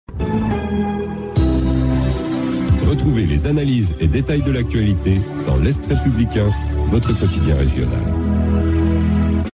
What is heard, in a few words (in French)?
Jingle; RFM Est; Est republicain